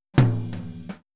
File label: Boogaloo Fill Tom Rack, 82 BPM; Sonic Foundry; Drums from the Big Room The Mixes